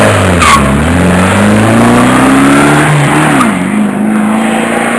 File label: car pass